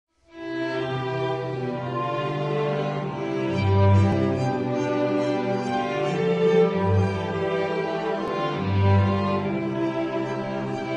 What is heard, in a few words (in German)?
Walzer